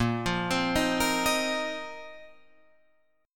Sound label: A sharp Suspended 2nd Suspended 4th down Guitar Drop D